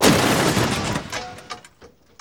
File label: TRUCK JUN, Transportation, Truck Junk